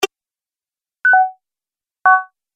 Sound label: Multimedia Small Sound Pack, high tech sound effects; Andrew Fleming